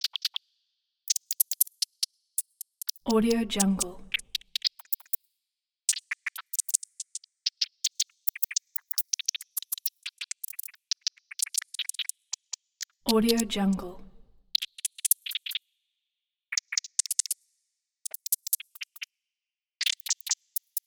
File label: modular ui text type hi tech; soundmorph